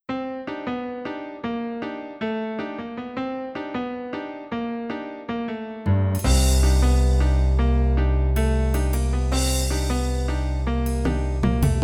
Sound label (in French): samba des gallinaces tutti